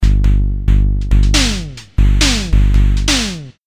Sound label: Loop 138bpm, Drums; leloops